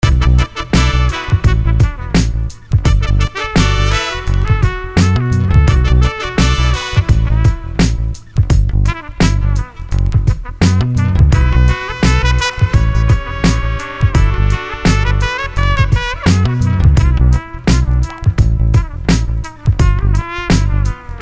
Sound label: Smooth Trumpet Grooves